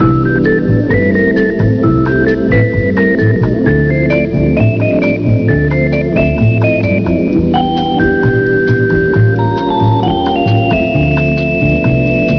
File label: elevator music; svencoop; sectore